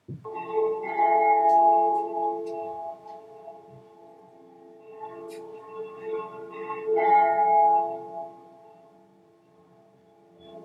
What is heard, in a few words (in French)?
Cloches